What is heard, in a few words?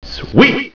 Got Lucky; Maelstrom 1.3